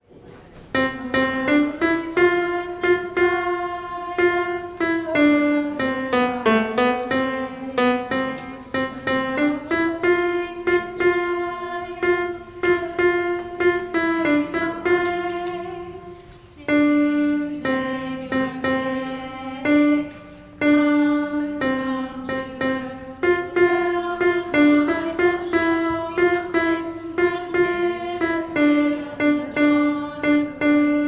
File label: alto; O Come Divine Messiah; Gather Hymnal